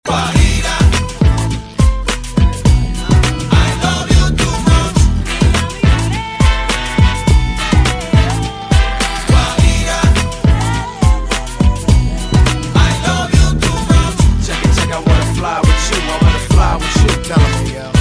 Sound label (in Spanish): guajira; Latin